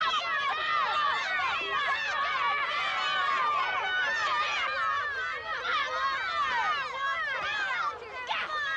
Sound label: beats up; scout